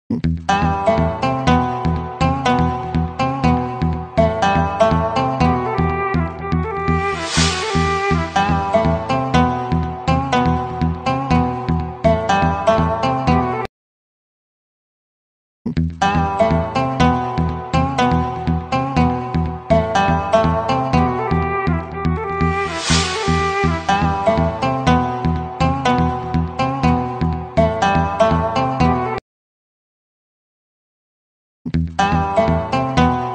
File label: song music only ringtone; Satyamev Jayate; Jhon Abraham